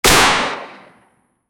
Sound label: a water gun shootin; weapon